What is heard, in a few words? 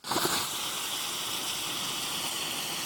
ui minigame blasting fuse; ryza